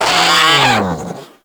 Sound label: Combat Vocal Death; Warframe; Catbrow